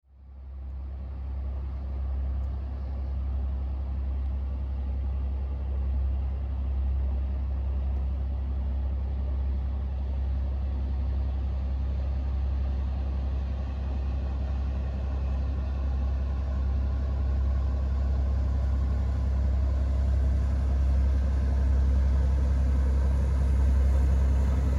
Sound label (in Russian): zvuk sudnar